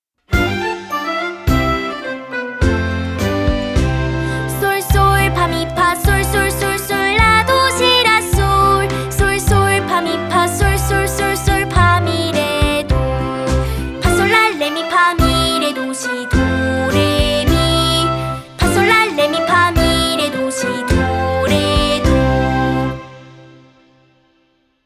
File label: scale